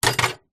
industrial small metal hand tool drop into metal tool box